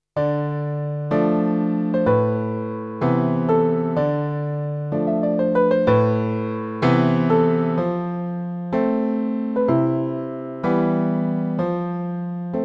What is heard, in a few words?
PIANO , Roland JD800